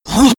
kill; freak fortress 2; some clean trash

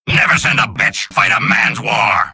kill; freak fortress 2; mad machine

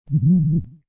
zombie laugh; freak fortress 2; employee

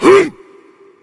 superjump; the myst scout

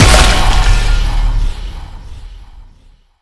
devourer death impact; calamity

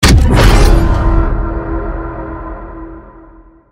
bolt; ff2; jupiter

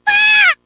stab; freak fortress 2; kirby

